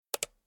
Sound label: click